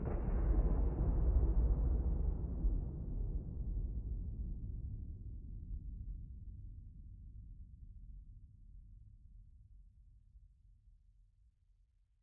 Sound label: end flash, ambient weather; minecraft 1.21.10